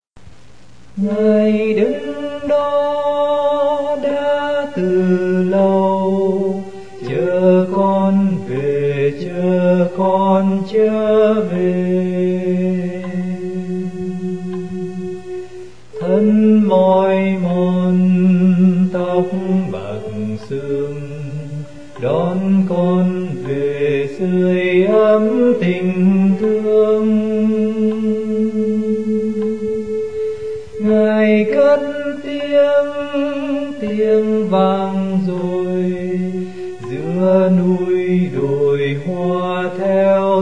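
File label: soprano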